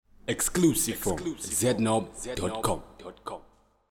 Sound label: jingle